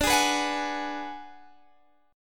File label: G sharp Minor 7th Flat 5th D